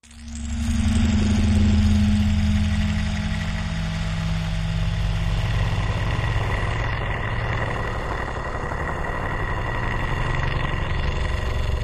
Drifted, Machine, Eclectic Sputtering, Movement, Wash, Airy